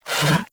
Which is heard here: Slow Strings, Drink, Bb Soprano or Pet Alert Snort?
Pet Alert Snort